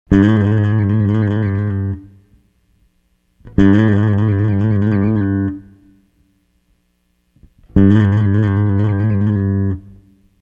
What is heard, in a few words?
Rock Bass